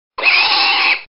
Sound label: Pig Scream